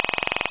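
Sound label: combat robot scan; hl2; combot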